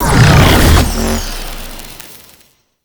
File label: Small Portal Deactivate; Duviri